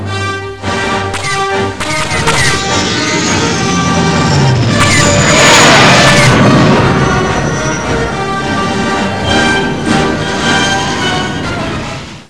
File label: death; stormtrooper